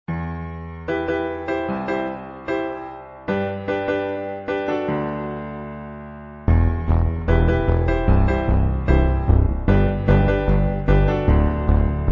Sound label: orchestral techno; ringtone